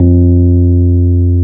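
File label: Piano Rhodes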